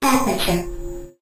post message announcer; Source Engine; Portal 2

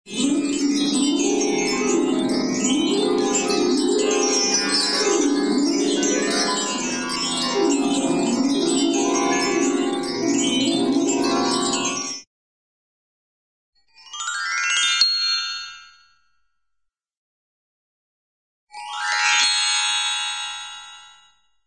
magic bells and harp; cartoon

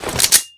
pistol deploy; Left 4 Dead; Source Engine

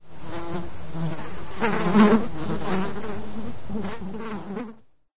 fly buzz, random animals; ambient; Left 4 Dead 2; Source Engine

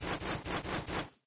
shotgun spin; video games; player; taunt; source engine; tf

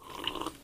sip; video games; player; taunt; luxury lounge; source engine; tf

